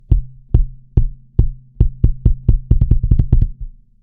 kick; Comp FET-76

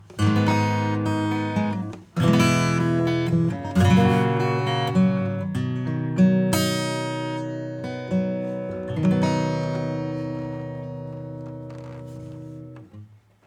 Acoustic

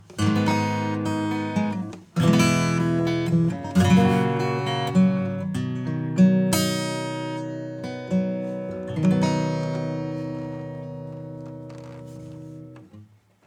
acoustic Funk Guitar Extra Spank; SplitEQ

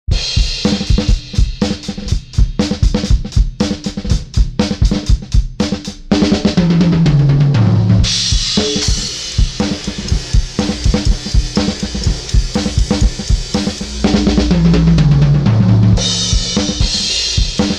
LOW PASS 6kHz; MASSIVE

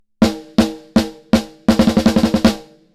snare

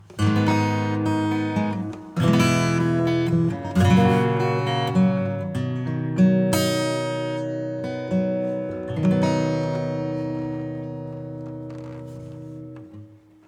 acoustic; PLATE-140

